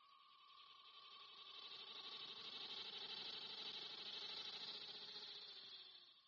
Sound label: cicada; dota